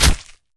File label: wurm tooth flesh; cdogs; Sand